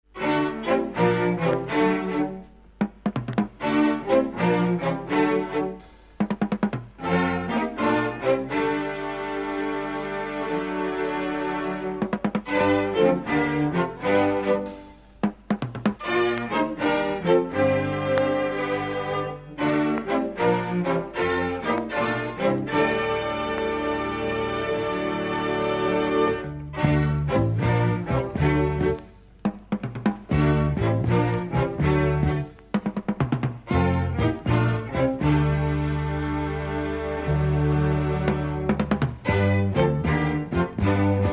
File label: rhythm & Strings